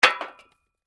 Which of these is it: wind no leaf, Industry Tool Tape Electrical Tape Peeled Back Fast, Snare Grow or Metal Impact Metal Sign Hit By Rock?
Metal Impact Metal Sign Hit By Rock